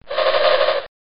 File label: Arrows Flying